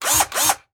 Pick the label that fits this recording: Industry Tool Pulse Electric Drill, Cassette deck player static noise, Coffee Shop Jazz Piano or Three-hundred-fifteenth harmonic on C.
Industry Tool Pulse Electric Drill